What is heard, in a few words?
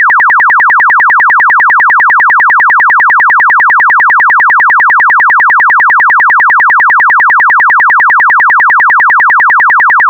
chirp; chirp toys